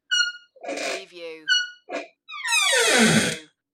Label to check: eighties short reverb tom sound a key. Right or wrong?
Wrong